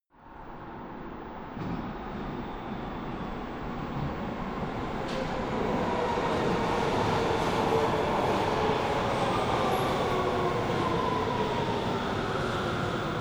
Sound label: train arrival big train station